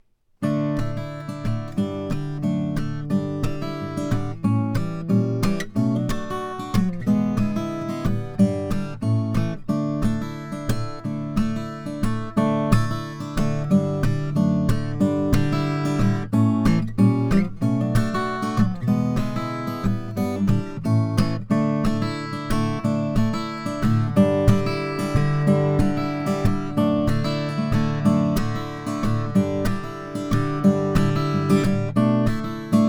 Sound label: auditorium birdseye maple, guitars; gallagher